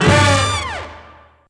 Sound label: Tuskan Toot; Mo' Phatt; Digital Sound Factory Mo' Phatt